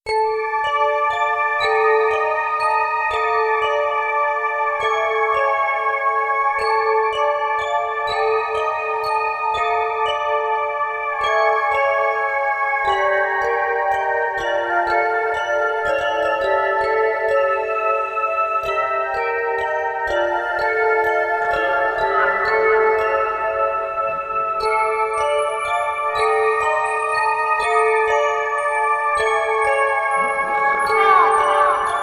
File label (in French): ahouuu avec beaucoup de reverberat; pendant cette nuit d halloween